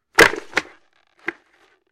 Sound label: Football Helmet Pla, football